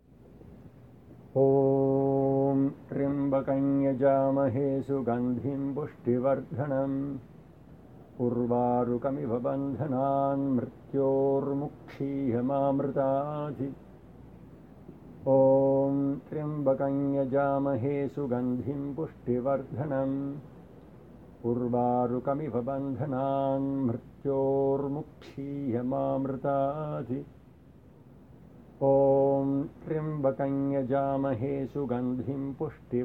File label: Mṛtyunjaya mantra Swamiji chanting